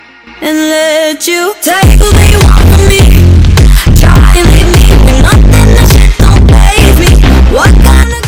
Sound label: music bass boosted